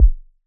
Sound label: KICK, DRUM HITS